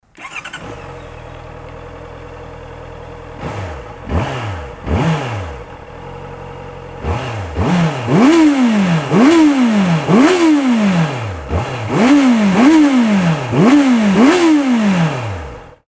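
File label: cb650f normal, motorcycle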